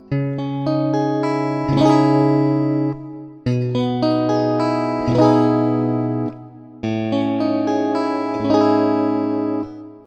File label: Guitar Animaiton; Game rip; MiSide